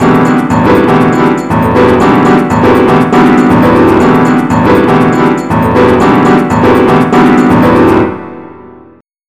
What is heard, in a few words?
samba; BUSKER L